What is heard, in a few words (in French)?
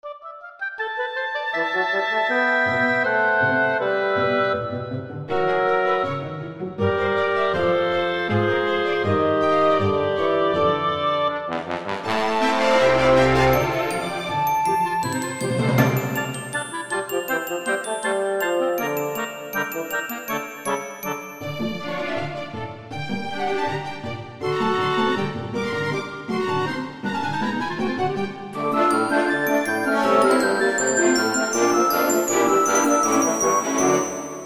Jingle; Encore le theme de Julie